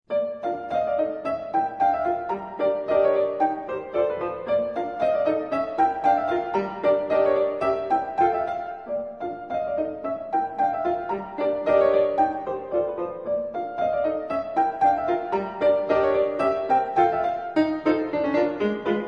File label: Polka